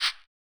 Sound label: Shaker, techno drum